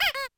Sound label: Shutter; LG; KU3800 Bubble Pop